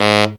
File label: TENOR G#; Zero-G; Phantom Horns